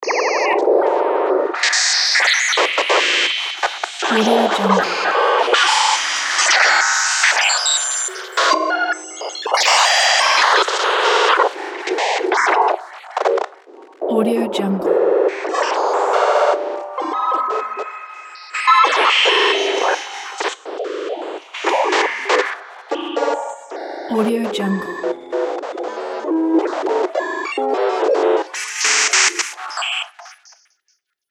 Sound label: malware infection